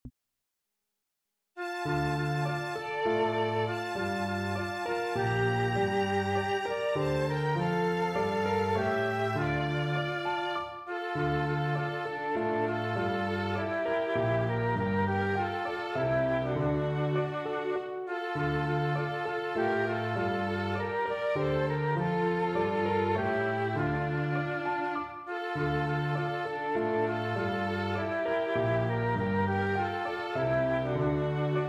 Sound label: instrument